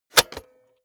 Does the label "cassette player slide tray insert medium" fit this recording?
Yes